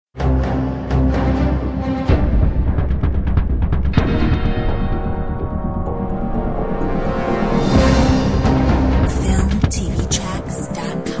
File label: fiction fight